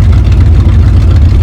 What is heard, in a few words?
bumper car go loop; tf2